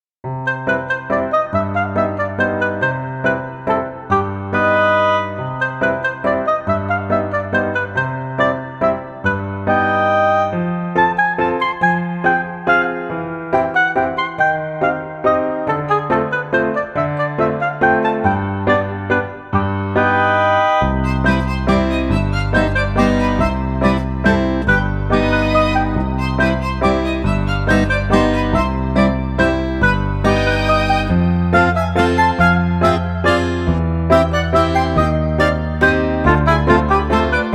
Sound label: Waltz, COMIC DRAMA